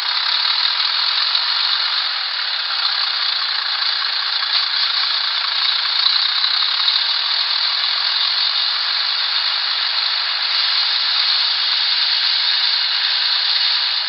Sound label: diesel